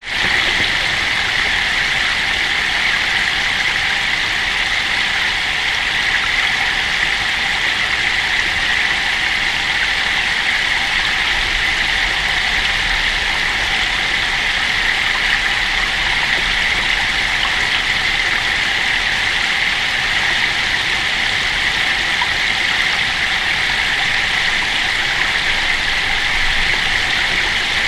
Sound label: weather rain on deep water underwater recording hydrophone